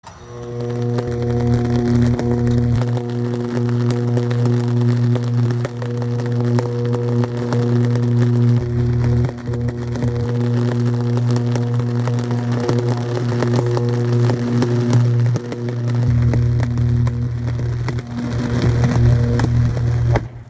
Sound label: High voltage arc